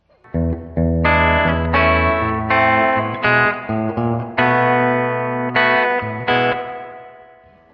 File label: turnaround, 12bar